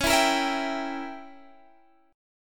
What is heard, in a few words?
C sharp Diminished 7th C sharp